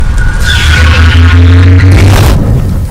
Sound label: toilet